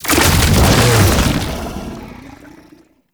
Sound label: Underground Attack Cast; Warframe; Abilities